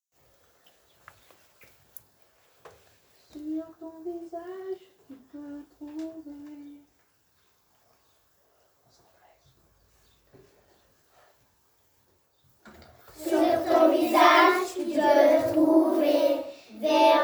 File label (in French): La chanson du visage Chorale; exposition 2020-2021